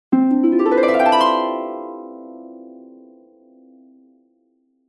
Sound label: harp